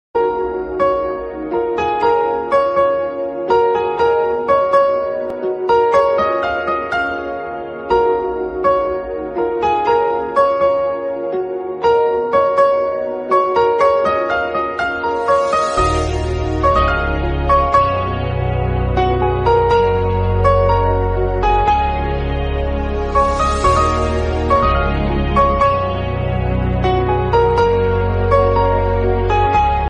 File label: punjabi ringtone, punjabi song ringtone, love ringtone, mobile phone ringtone